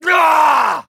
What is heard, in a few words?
aaarrgh